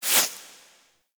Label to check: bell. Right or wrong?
Wrong